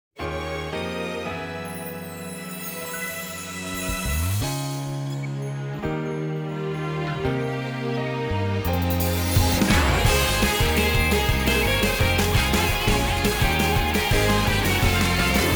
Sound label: inst